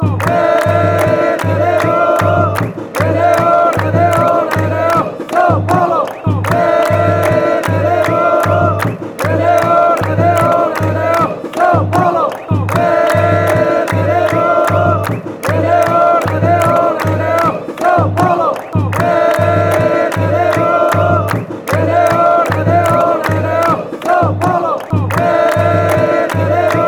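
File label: sao paulo chant, football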